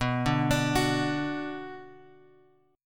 B Minor B; Bm Chord {X 2 0 X 3 2} arpeggiated

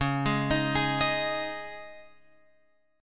Db Suspended 2nd, Guitar, Open F, Dbsus2 chord; arpeggiated; JGuitar

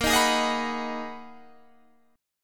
Ab Minor 7th; Abm7/Bb; strummed

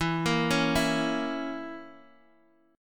Db Minor 6th E

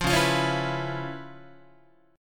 Db 7th Sharp 9th Db down Guitar; Db7#9 strummed; JGuitar

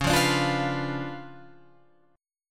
Db 7th Flat 9th, Guitar, Open F; Db7b9 strummed; JGuitar

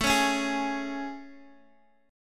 A Major 7th A, Guitar, DADGAD

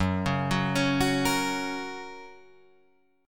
Gb Major, Guitar Drop D

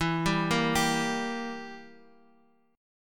E Diminished E, Guitar, Open F; Edim arpeggiated; x,x,4,2,1,2; JGuitar

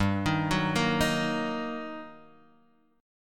G Minor Major 7th Sharp 5th, GmM7#5 chord; arpeggiated; x,x,x,0,3,1,0,0; JGuitar

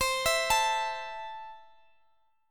C 6th, Guitar, DADGAD

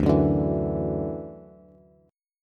D-Major Add 9th D down Bass; strummed; JGuitar

